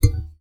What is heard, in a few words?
Classical Guitar Extended Technique